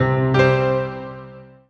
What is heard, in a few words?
MUSIC EFFECT Solo Piano Positive; startide macos switch windows gamerip 2017